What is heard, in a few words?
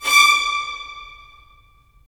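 strings violins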